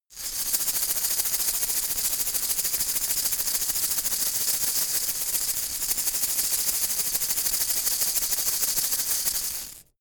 insect shaker rattle hard

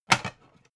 pan stack hard

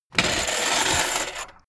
bmx bike grind metal

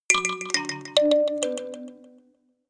notification kalimba delay sequence minor

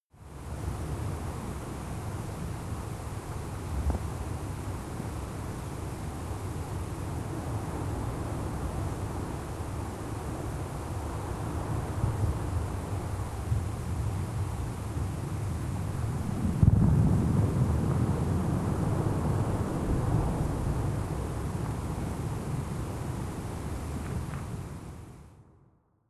water fx windy

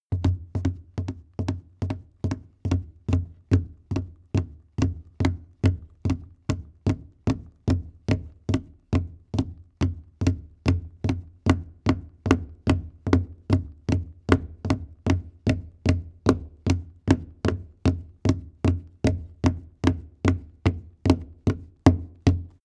step wood walk

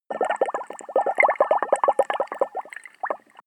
bubble fast

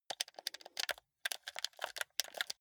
console controller play sequence lively

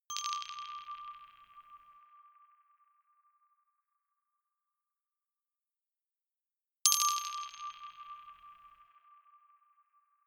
notification glockenspiel rattle single low

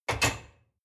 dishwasher cup place soft

